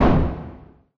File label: dyna bang; GoldMinerJoe